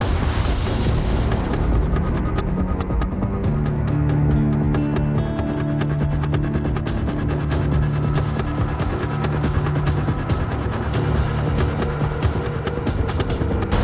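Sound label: Gladiator Cell Walk Loop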